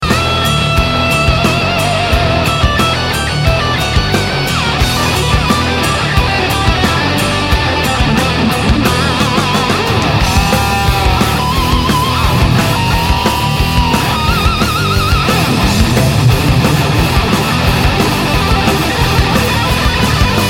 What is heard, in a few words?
heavy metal solo